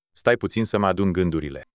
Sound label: thinking